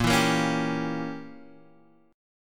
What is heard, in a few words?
A sharp Diminished 7th, Guitar Half Step Down